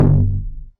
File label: damage taken